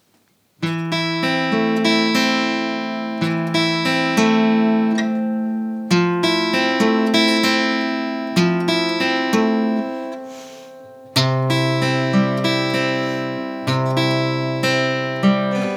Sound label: Apollo Lynx Hilo Acoustic